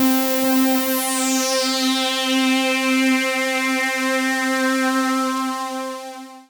PAD; Best Service; XX Large; Pads

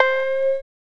SYN PLK

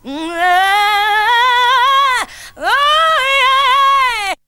VOC; ZERO-G; Datafile

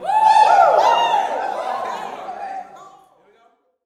"Woo", Vocal Gospel yell shout; Spectrasonics; Vocal Planet Vol.1 Gospel; akai

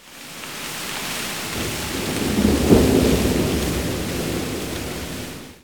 rain FX; E-Lab; X Static Goldmine 1

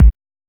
HSE BD

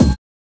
HSE BD

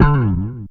BASS FX; E-Lab; X Static Goldmine 2; akai

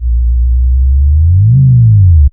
SYN-FX

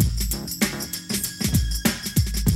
BREAK

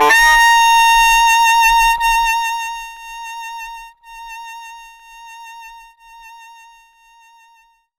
SAX RIF; E-Lab; X Static Goldmine 2; akai

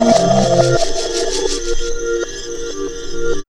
REVERS

